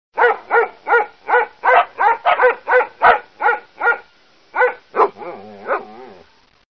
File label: news tone; ringtones; sms